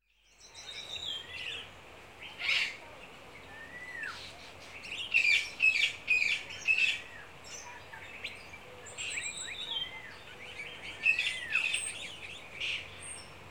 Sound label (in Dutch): Vogels Regenwoud; KMP Film Video Sound Effects